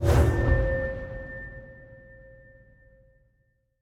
end portal eye place; Minecraft; Votive World

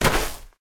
sand, step; Minecraft; Votive World

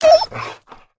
hurt, wolf; Minecraft; Votive World

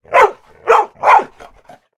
bark, wolf; mob; Minecraft; Votive World

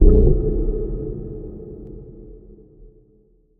moan, ghast; mob; Minecraft; Votive World

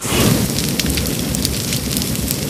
breathe, blaze; mob; Minecraft; Conquest Default

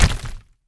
land, pointed dripstone; Minecraft; Votive World

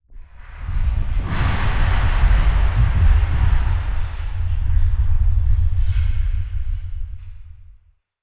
cave, ambient; Minecraft; Votive World

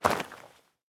gravel, step; Minecraft; Votive World

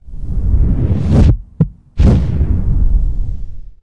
resonate, bell; Minecraft; Votive World

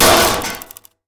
damage, irongolem; mob; Minecraft; Votive World

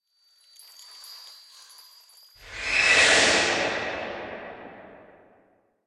swoop, phantom; mob; Minecraft; Conquest Default

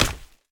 hit, damage; Minecraft; Votive World

